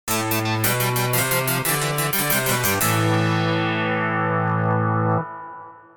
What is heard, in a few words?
Game Over